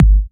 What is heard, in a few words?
Kicks; Vengeance Samples